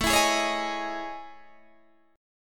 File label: A Major Sharp 11th, Guitar DADGAD